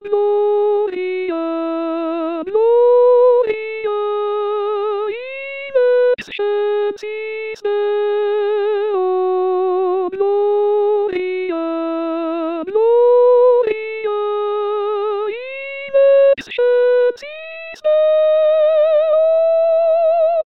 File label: gloria soprano